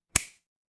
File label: fire electric spark